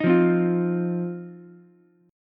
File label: C Minor Double Flat 5th C down Ukulele; Cmbb5 strummed; JGuitar